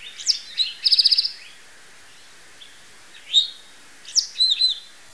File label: towhee